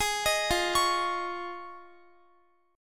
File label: F Minor 7th Sharp 5th, Guitar; arpeggiated